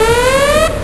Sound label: alarm; GregTech; OpenSecurity